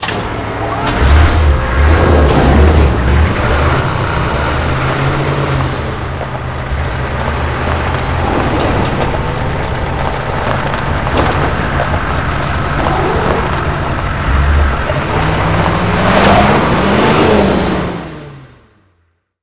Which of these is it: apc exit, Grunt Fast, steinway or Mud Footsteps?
apc exit